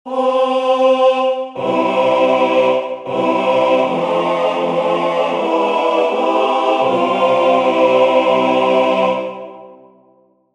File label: All Parts, barbershop tags